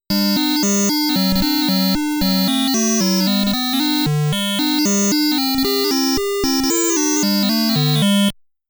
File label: High Score Music